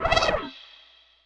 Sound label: Warble, Noise Kit; Loops for ACID: Cyclotronic Resonator